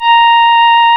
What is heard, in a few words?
REED A#; Big Fish Audio; Synclav FM